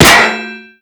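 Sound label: bullet impact paint metal; Modern Warfare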